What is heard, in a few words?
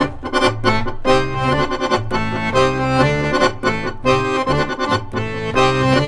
ACC, Balkanish Traditions